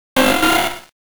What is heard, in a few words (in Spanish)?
Grito de Wingull